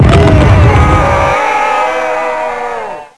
lose; ConAtSea

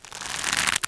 rope; ambient; Shadow Man

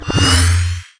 activate, shield; SINDEMO; player

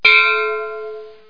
bell; Stiff Competition

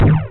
FX MENU; Bombahman

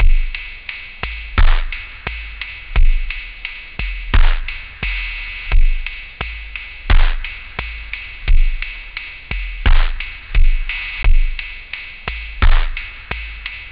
bell, house; OctaMED SoundStudio V1

